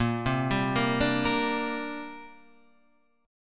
C 7th Suspended 2nd Bb

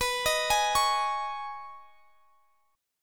B 6th Add 9th B down Guitar Standard; B6add9 arpeggiated; chord shape; JGuitar

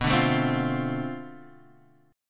B Minor 7th Flat 9th Flat 5th B, guitar chord; Bm7-9-5 Chord {X 2 0 2 1 1} strummed

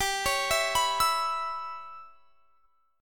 G 6th Flat 5th; G6b5 arpeggiated; x,22,23,21,24,24

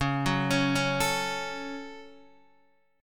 Db Suspended 4th Sharp 5th, Guitar, DADGAD

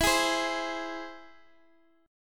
E Augmented, Guitar, Half Step Down

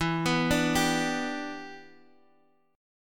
E Minor 7th, down, Guitar, Half Step Down

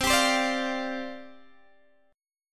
C Suspended 2nd Suspended 4th, C, Guitar, Open G; down; chord shape

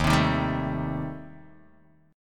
Eb 6th Flat 5th, Guitar, Open D

